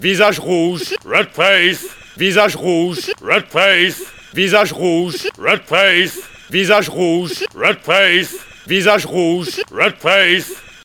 visage rouge red face

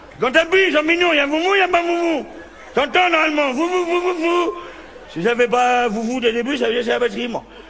batterie

